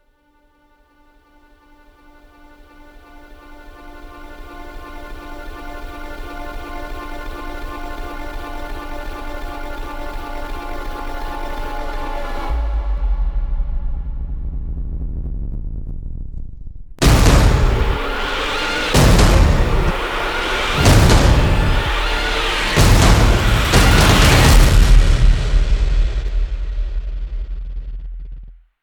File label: Scared, sound design